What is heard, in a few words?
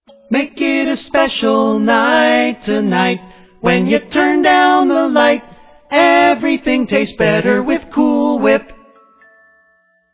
Commercial Jingles